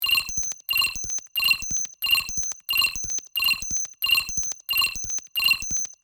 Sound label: Sci fi atmosphere unauthorized access loopable